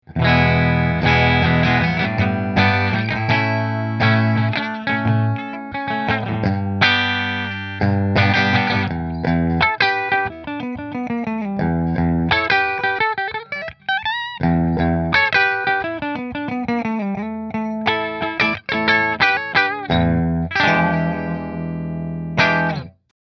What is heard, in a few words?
Parker Bridge Fender, guitars